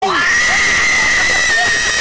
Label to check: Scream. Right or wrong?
Right